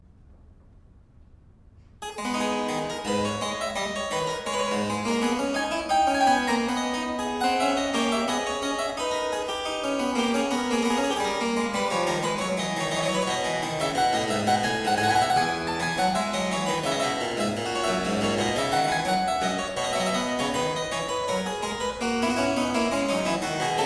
English Suite No 2 in A Minor - Courante, Harpsichord; Johann Sebastian Bach; JS Bach Works For Harpsichord